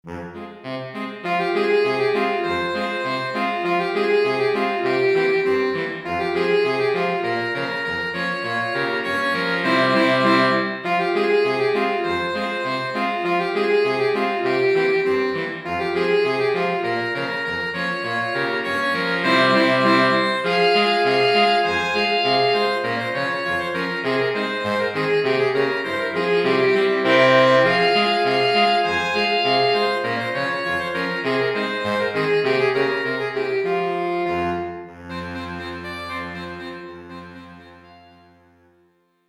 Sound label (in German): saxophonquintett